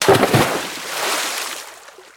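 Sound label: splash large; themepark; rides; splash mountain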